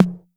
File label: 606 TOM